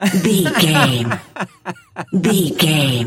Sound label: voice male laugh high short